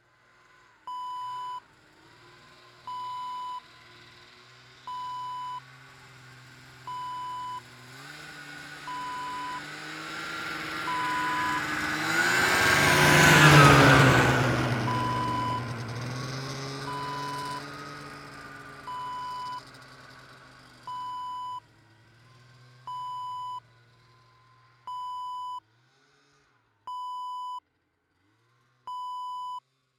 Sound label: snowmobile pass by